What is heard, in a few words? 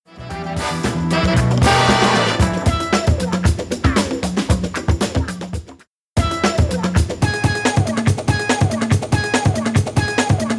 Percussion